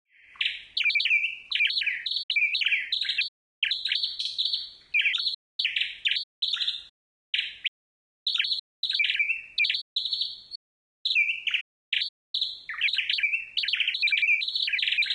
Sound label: nightingale; max-msp; Techniques and Aesthetics of Plant-Generated Control Voltage